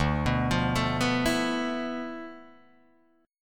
D Minor 6th Add 9th, Guitar, DADGAD

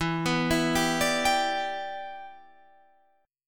E Minor 7th, down; Em7; arpeggiated

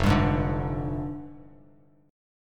F Minor 6th Add 9th F down Bass Standard; JGuitar

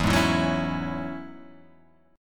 E 6th Add 9th E down Guitar Half Step Down

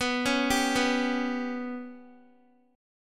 B Suspended 2nd Sharp 5th down Guitar; Bsus2#5 arpeggiated; JGuitar

